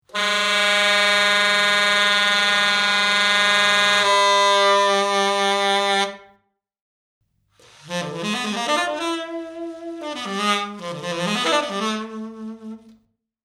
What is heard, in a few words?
sample1, sax